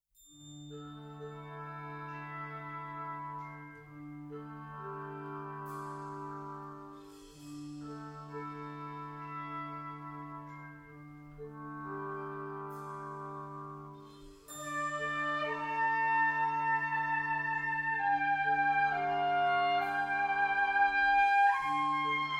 folk song settings for band

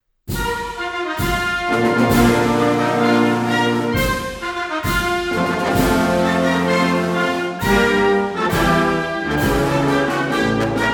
marching

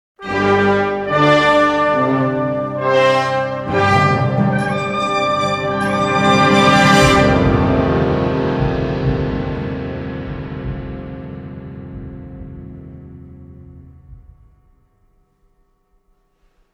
folk song settings for band

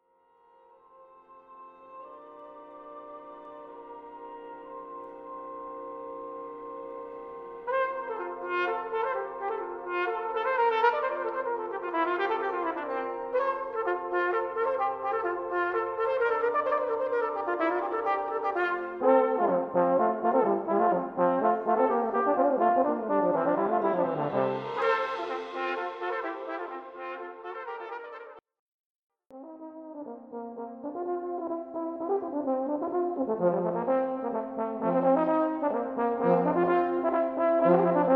nordic polska

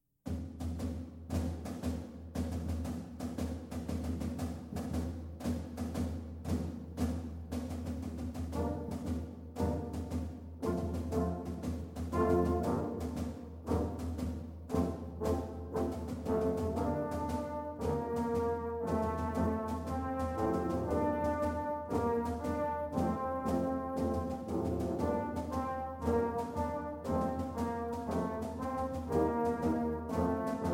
celtic dance

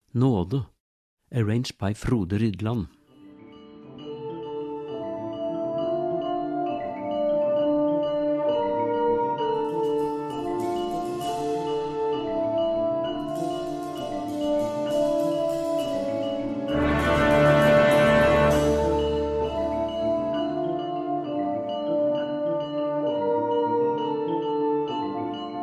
Concert Band Sound

